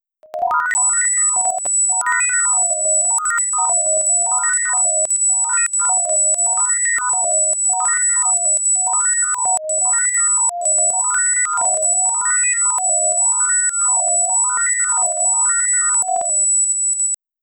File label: VTach